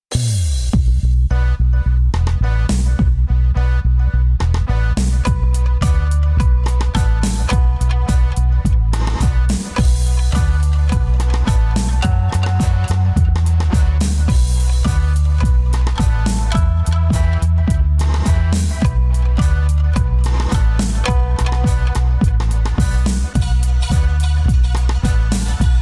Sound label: Dub